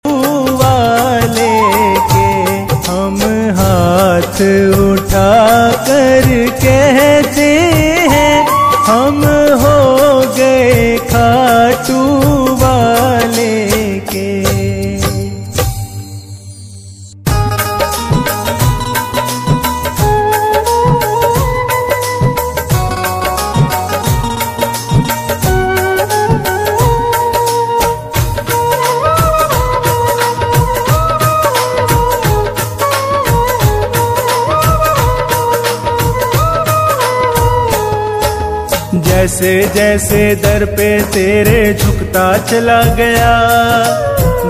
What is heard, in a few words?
Khatu Shyam Bhajan; Baba Shyam Superhit Bhajan